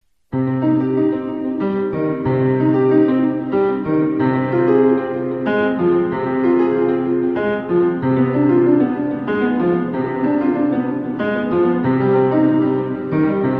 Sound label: Prelude C minor; Bach; BWV 999